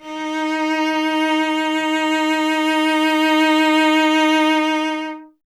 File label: STR cello D#, Cello; Miroslav Vitous; Solo Instruments VOL-1